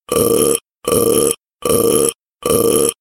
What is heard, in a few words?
Burp